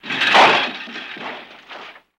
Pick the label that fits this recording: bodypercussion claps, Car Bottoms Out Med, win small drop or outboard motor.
Car Bottoms Out Med